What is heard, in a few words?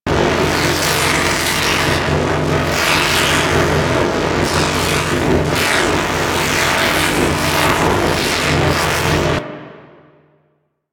Beam Gun Loop 3D; Player Path Beam; Fomorian